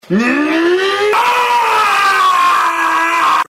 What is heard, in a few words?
roar